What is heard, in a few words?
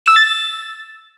COIN; YWW